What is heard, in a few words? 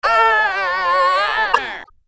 stun, spirit; slender; dbd